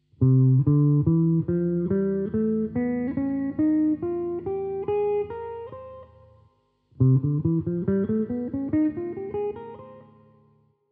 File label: ion phryg; Jazz Modes and Scales